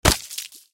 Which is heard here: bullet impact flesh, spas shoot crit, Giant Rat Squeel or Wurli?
bullet impact flesh